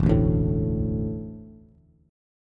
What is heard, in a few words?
E 7th Suspended 4th, Bass; E7sus4 strummed; JGuitar